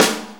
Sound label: Snare B; Sonic Foundry (Sony Creative Software); Loops & Samples Drum Tools